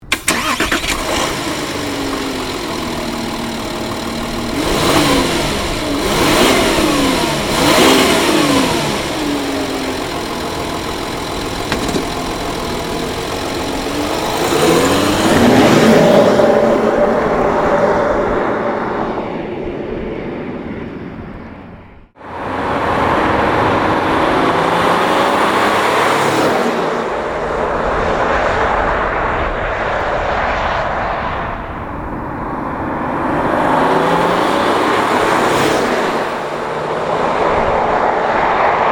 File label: Audi Q7 TDI Quattro